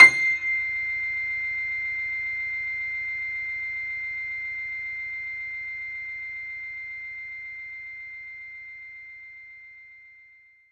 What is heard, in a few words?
Grand Piano C7